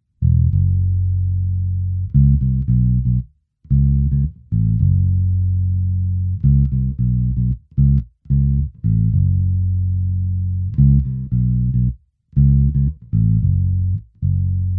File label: Finger style deep